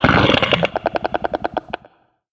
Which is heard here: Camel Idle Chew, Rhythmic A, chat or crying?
chat